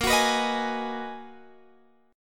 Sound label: B Major 13th A sharp